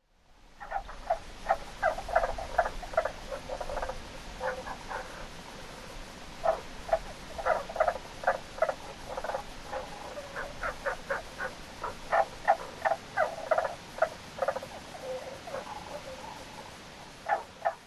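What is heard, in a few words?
Tago's brown frog, okutadami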